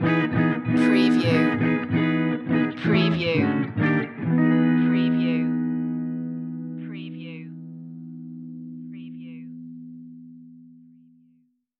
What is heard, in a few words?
lounge loop ending